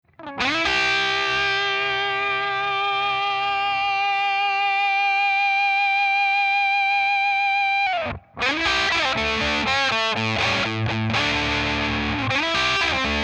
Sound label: Straight Up Tone